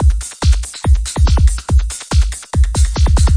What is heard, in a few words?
142 electro techno, drum loop; 141 to 160 bpm